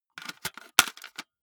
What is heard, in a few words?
cassette into walkman